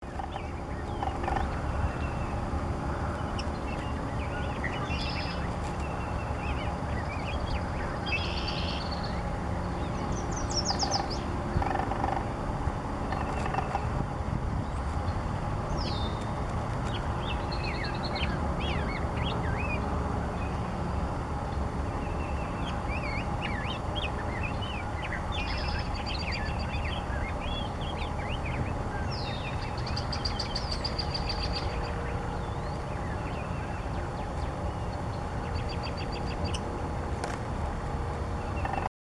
Common Nighthawk, Chordeiles minor; Aves; iSoundNat; inaturalist

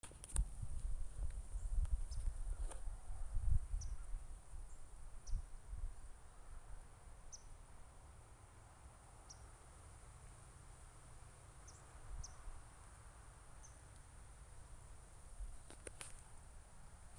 Puerto Rican Nightjar, Antrostomus noctitherus; Aves; iSoundNat; inaturalist

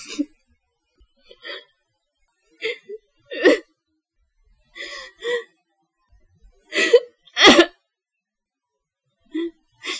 crying

